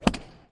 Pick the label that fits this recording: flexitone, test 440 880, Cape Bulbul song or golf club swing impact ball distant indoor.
golf club swing impact ball distant indoor